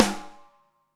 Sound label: DYN PICC, SNARES; Back In Time Records; Big Bang